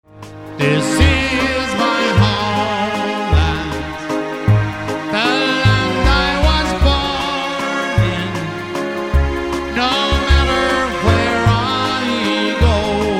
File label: Irish, sings irish songs